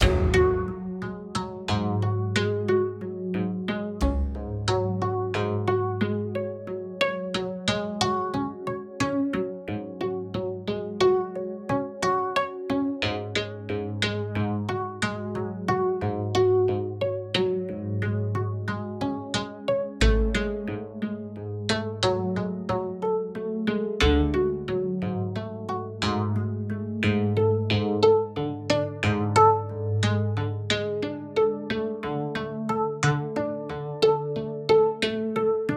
Piano Beat Cinematic Calm Keys Slow

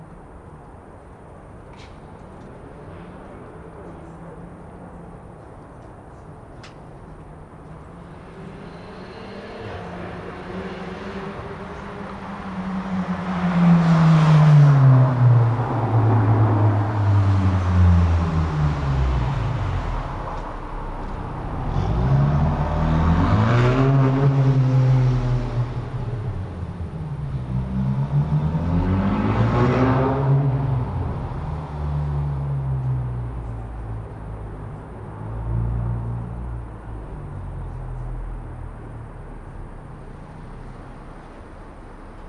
Car Sound Booster In City

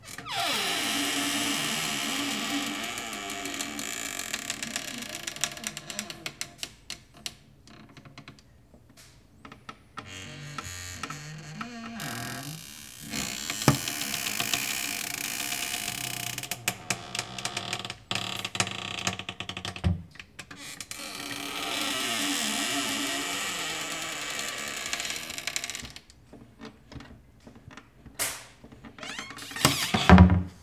Scary Cabinet Screech

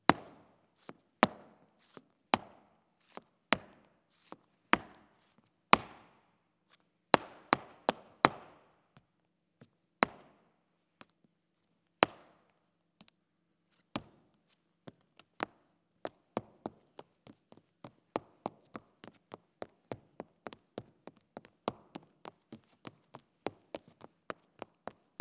Taconeo Flamenco

